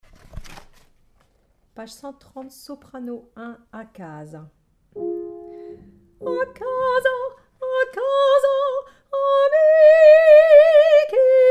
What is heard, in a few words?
Soprano